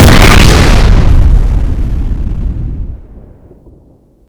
explode, explosions; Impacts; FEAR

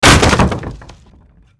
razbiv, doors; FEAR

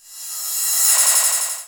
Percusive; Akai; Mule

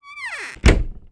door closed; FEAR

